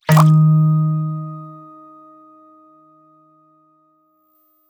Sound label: Bounce Wall